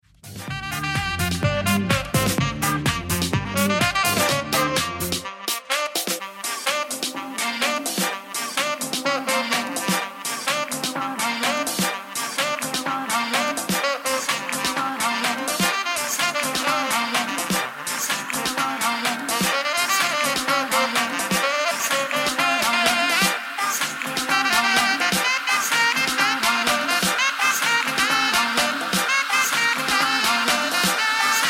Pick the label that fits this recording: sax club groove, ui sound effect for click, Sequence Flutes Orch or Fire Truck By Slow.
sax club groove